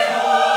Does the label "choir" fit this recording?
Yes